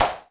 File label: noise fx; Masterbits; Switch FX